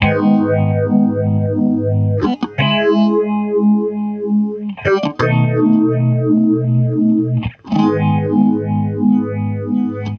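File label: guitar, Roots Funk; USB Soundscan vol.41; AKAI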